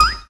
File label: lucky Reel Two; jewel fruits